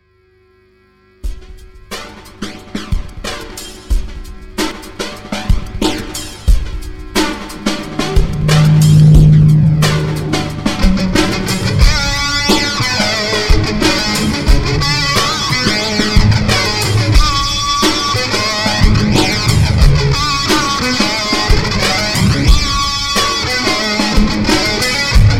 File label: Flanger Jam